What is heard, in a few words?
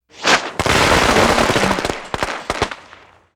fireworks firecrackers; hollywoodedge